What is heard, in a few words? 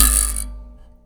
Finger Bass; Battery 3 Sample Kits